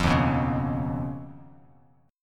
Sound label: D sharp 7th Suspended 2nd Sharp 5th Bass Standard; strummed; JGuitar